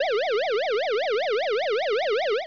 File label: GOAL; hockey95